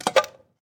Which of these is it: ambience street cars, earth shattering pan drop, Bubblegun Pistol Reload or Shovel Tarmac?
Shovel Tarmac